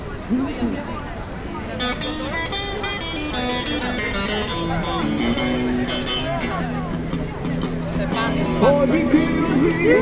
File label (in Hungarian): kalapozó; sagrada familia